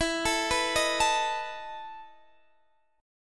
E Major 7th Suspended 4th E down Guitar Half Step Down